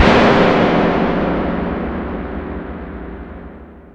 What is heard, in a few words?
IRON DOOR; THE FICTION